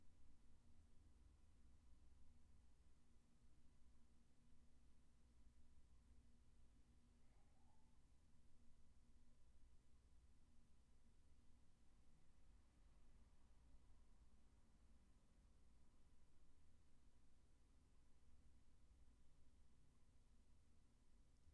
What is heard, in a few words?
room tone